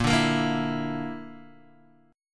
A sharp Major Flat 5th, Guitar, DADGAD

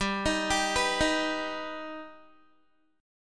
G Augmented G down Guitar; arpeggiated; 4E,4A,5D,5G,5C,6F

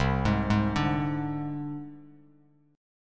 C Suspended 2nd Sharp 5th, down, Bass Standard; Csus2#5 arpeggiated; JGuitar

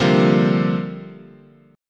C 7th Suspended 2nd Suspended 4th, chord

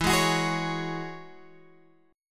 E 6th Add 9th, Guitar, Open G; chord shape

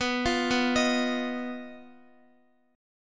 B Major Flat 5th, Guitar, 4F,4A,5C,5G,5C,6E; BMb5; arpeggiated; x,x,11,10,11,11

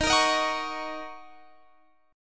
Eb Minor 7th Eb Guitar Standard; strummed

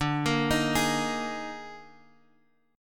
Eb Major 7th Suspended 4th D

